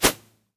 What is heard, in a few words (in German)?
zisch; Cartoon - film effects